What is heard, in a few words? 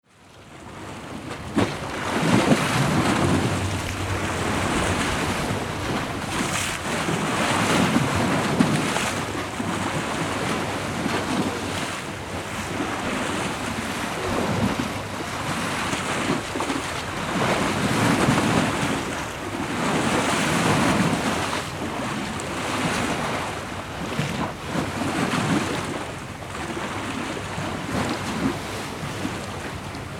River Thames London; River Thames near Greenland Dock, London, UK on 30th November 2024; The tide was very high and choppy, hitting the side walls. You can also hear planes coming and going from the nearby London City Airport.; Cesar Gimeno Lavin; Field Recordings